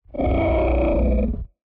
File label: mechanimal; fvtt sonniss gdc audio